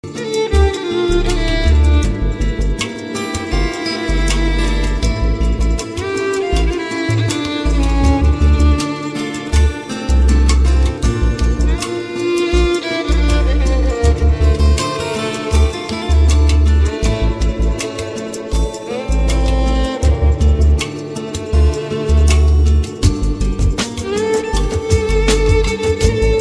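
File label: Cute Violin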